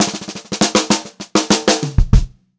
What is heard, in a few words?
FILL, Pop-Rock Drumloops; USB Soundscan vol.37; AKAI